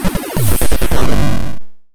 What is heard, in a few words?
Charged Lightning Attack 8-Bit